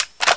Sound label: sg552 clip in; svencoop